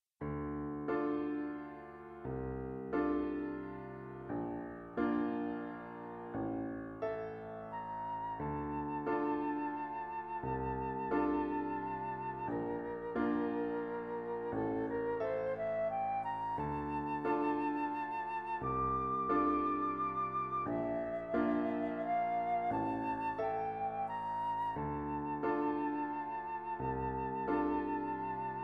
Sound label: Flute and Piano